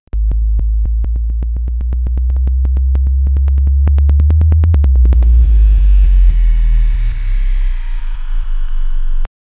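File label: the sound of fast movement; time manipulation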